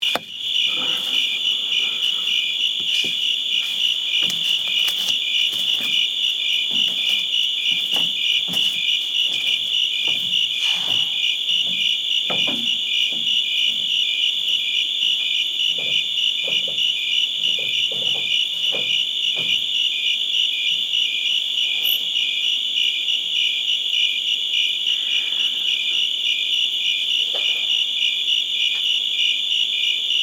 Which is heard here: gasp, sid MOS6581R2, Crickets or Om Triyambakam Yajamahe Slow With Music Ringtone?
Crickets